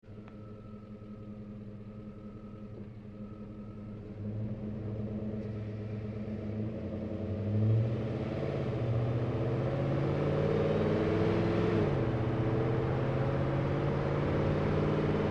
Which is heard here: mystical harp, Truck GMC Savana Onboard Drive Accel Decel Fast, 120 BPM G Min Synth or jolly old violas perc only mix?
Truck GMC Savana Onboard Drive Accel Decel Fast